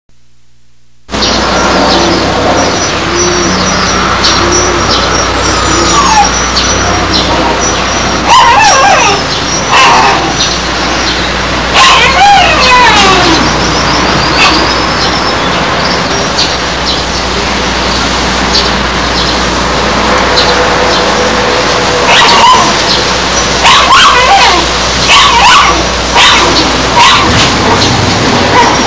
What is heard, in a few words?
dog